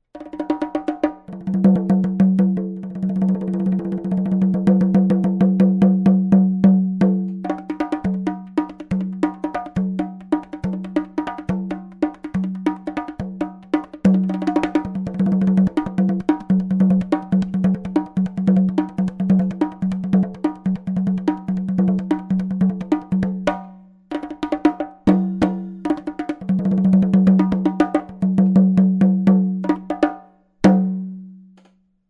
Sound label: Bongo